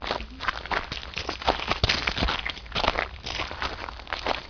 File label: nature